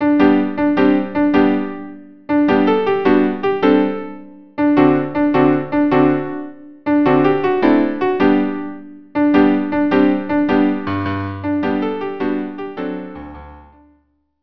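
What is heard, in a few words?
violin piano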